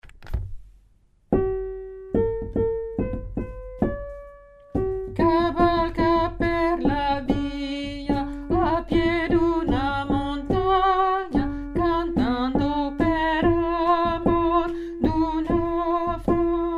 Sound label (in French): alto; cavalier dispagna